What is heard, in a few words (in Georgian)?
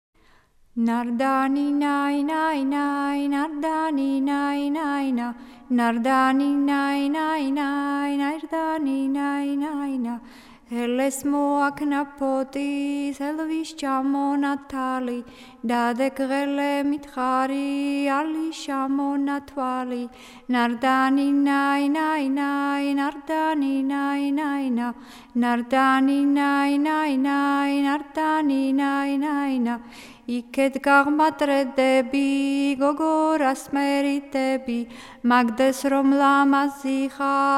nardanina acharuli saxekvao; Mzetamze